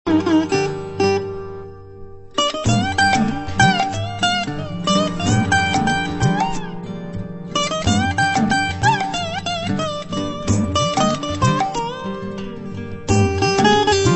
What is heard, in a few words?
slide guitar odyssey